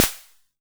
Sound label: SD A; Olson XX-100